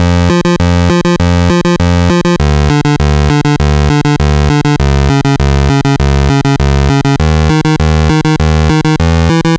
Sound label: NES Bass, chiptune